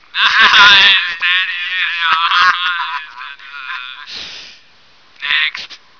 death

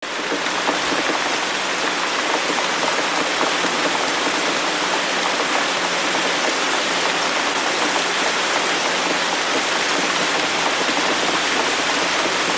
water from aqua

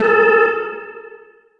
alien siren

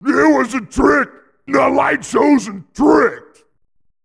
death; mr-satan

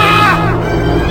pain; tien

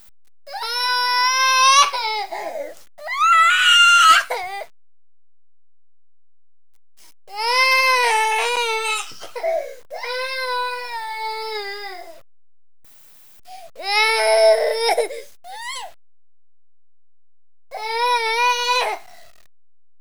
baby cry

